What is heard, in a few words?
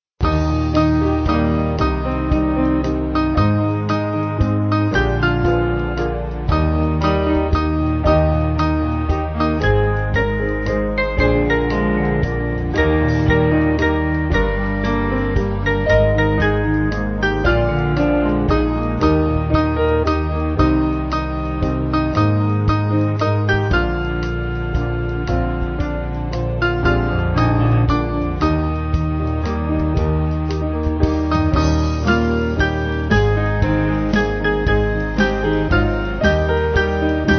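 Band, Music Backing Files for Churches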